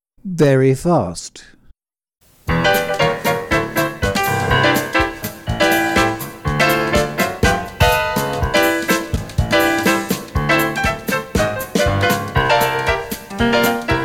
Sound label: Very fast 4 4